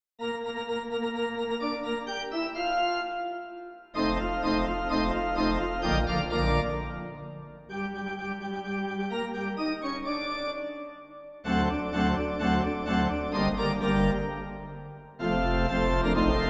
Italian national anthem canto degli italiani organ transcription; novaro michele